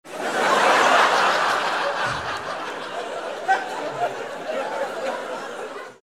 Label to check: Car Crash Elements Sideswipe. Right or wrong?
Wrong